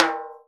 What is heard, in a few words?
snare, timbales; Univers Sons; Basicussions